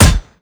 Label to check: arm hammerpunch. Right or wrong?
Right